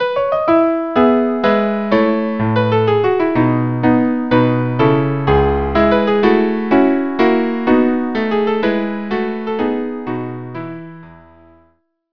minuet trio; Schubert

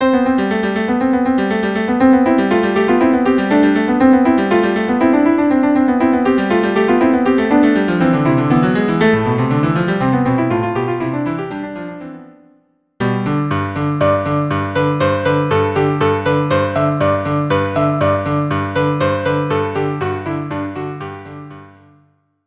viola cel; Dance of Jesters; Rims Kors

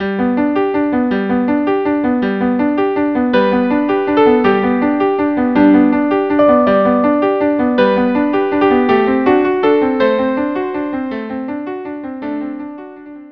viola duo

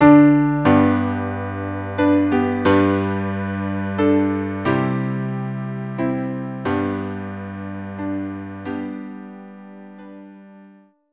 string quartet; Amazing Grace

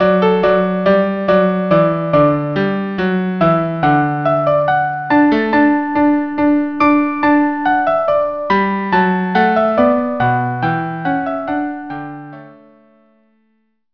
March vn vcl; Richard I

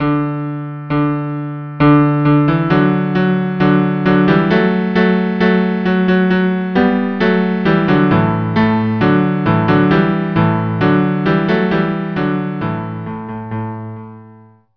cello cello

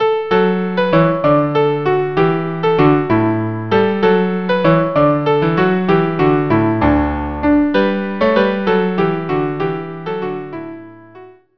light of love viola

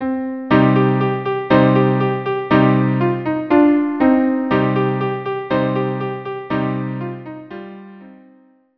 viola duo; O Hanukkah

